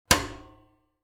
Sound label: mechanical clonk